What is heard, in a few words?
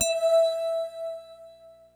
beauty bell, Synths; Notes